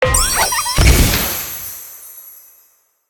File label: bonus Multiplier Level; fairyred